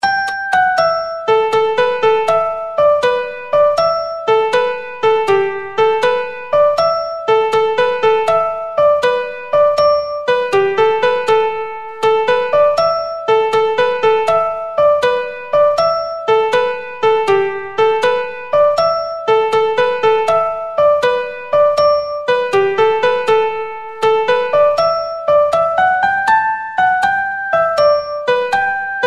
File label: Barrack Hill Slide